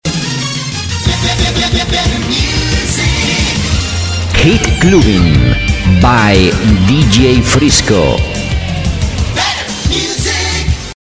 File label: jingle hit clubbing